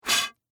Gun Barrel Blow Air; Warfare; Miscellaneous Combat Sound Effects

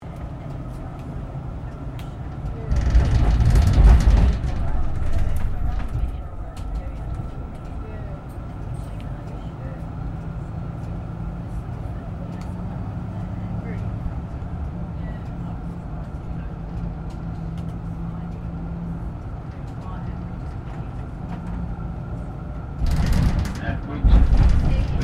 Tram Melbourne B1 Class Interior; Various Melbourne trams; Streetcars (Sound Effects:Vehicles)

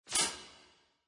Laser Hit, Laser Hit Descend; Sound Design; Scifi Production Elements, Set 1; Lynne Music

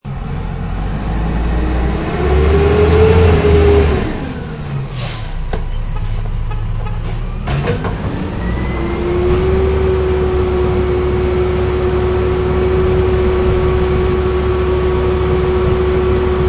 Garbage Truck, Garbage truck working; Trucks; Vehicles

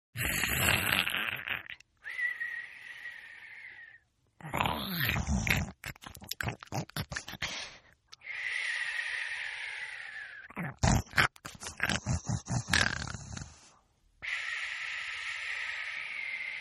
Snore; Snoring; Human; Partners In Rhyme

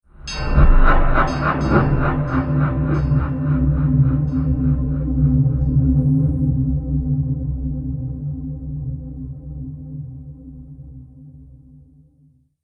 Dark Motives Trailer Hit; Trailer Sound Effects

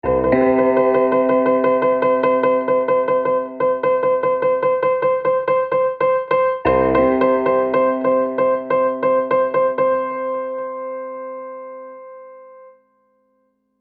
Tuning A Piano, Tuning an upright Piano.; Pianos; Musical

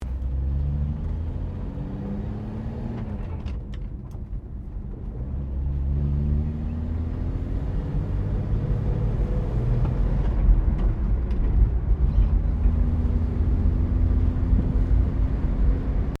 CAR HOLDEN FE 1957 INTERIOR ACCELARATING; Classic Australian cars; Vehicles

